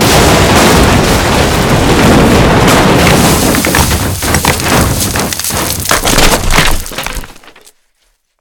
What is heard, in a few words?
shelter fall; Original TK Sounds